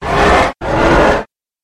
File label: monster dragon green